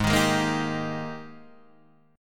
Ab Major 7th Suspended 2nd Ab down Guitar Standard; strummed; JGuitar